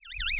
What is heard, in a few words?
Alien Disappear